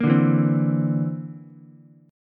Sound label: D 7th Suspended 2nd Sharp 5th, Ukulele; D7sus2#5 strummed